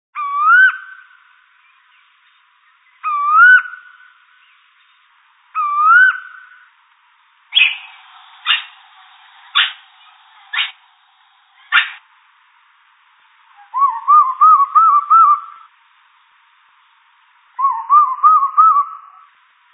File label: common Koel, cuckoos; canberra garden birds